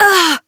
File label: Generic Short Pain, Operator Voice; Operator Mission